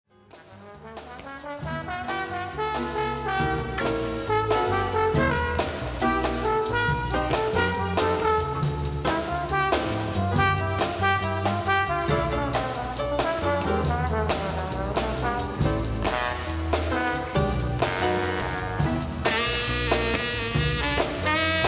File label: Bossa